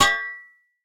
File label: salvaged icepick strike; Rust